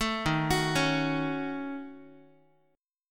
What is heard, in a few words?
Eb Minor 7th Flat 5th, Guitar; arpeggiated; chord; 12,11,9,11,x,x; JGuitar